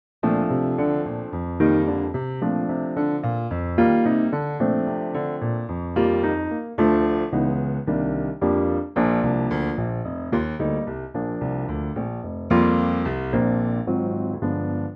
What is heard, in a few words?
Bb piano 220 bpm; key - Bb - vocal range - F to Bb; Bright 220bpm piano only arrangement of this classic American standard